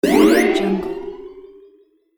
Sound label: tunnel game rise